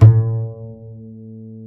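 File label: double bass A2, Jazzistic; Univers Sons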